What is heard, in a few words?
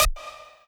lead